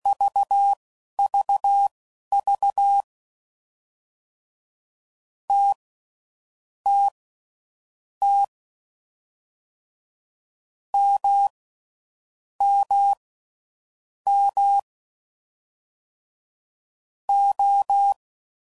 All Dahs, CW Code Groups 10WPM; Morse Code; Short Runs

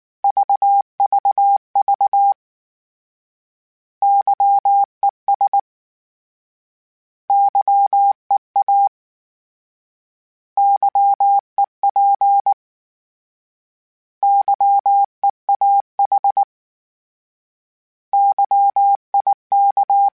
Morse Code, CW Code Groups; HamRadio

